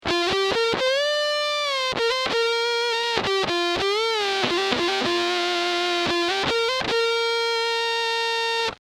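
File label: Octavia, guitar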